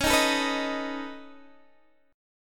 C sharp 7th Suspended 2nd Sharp 5th C sharp down Guitar Open D